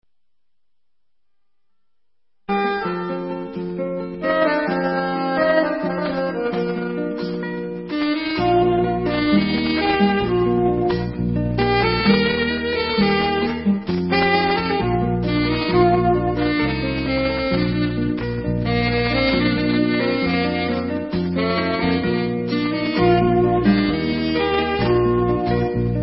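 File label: Instrumental, Israeli Dances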